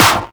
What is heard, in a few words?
Clap; Vengeance Samples; VEC1 Claps